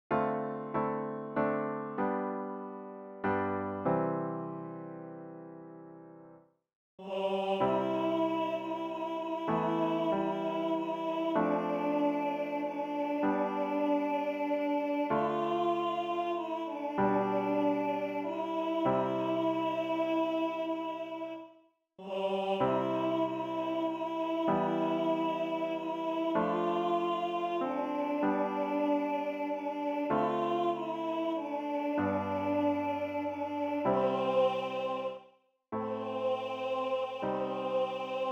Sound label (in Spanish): tenor; Cantata para SATB, Solistas y Narración; CORO Hombres TTBB